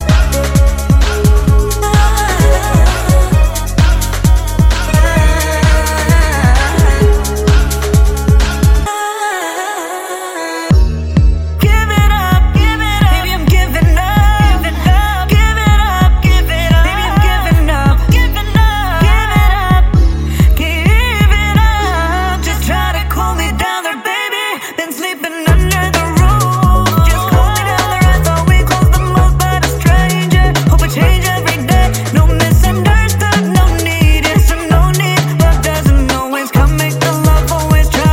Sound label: White Chorus Remix